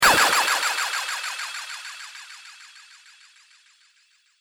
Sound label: Laser Future Plasma Pistol Echo; Laser Gun Sound Effects; Sci-Fi Laser; Andrew Fleming